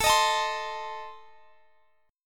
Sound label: A Diminished, Guitar Drop D; chord